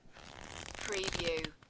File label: paper fanning book